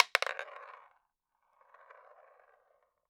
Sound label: Small Marble Throw-In; The Sound Pack Tree